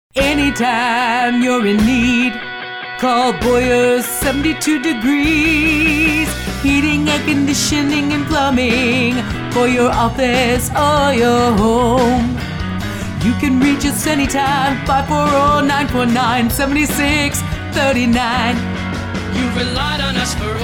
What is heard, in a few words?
3 voice jingle; boyers